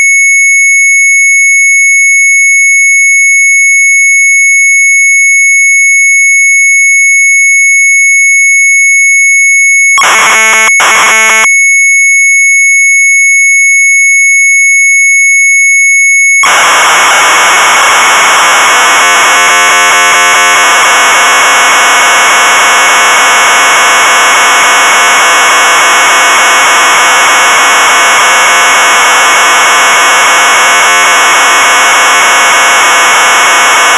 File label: MZ800 Tape; BASICODE 2 3-800; sharp; retromelsarchive